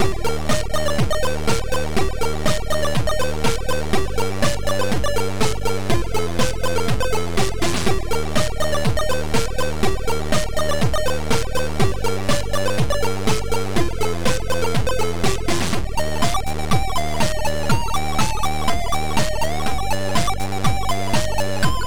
Game menu; Lost Donation Box Incident

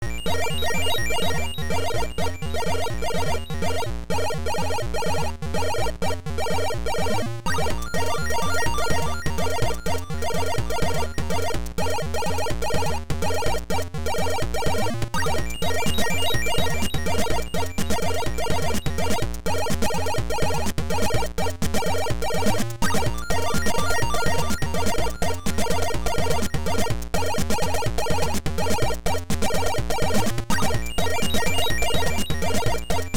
Title AY Beeper; Chase HQ

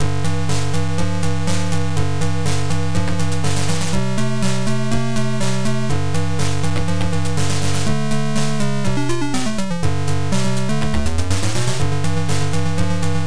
Rock n Roll; Made in Rus

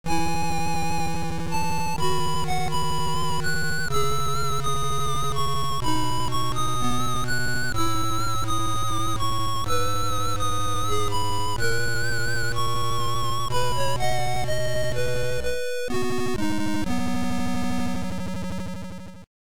Title AY; Hawk Storm

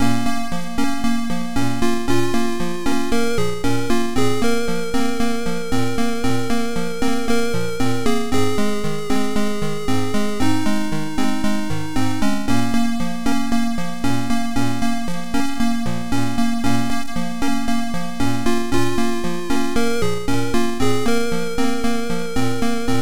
Guitar intro AmigaCD32; X agon

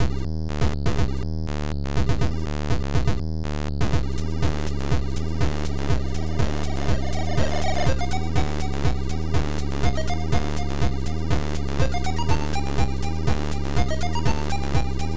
Russian Groove

